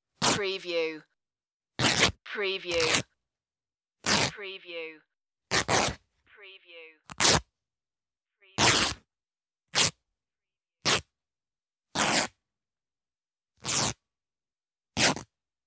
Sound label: ripping cloth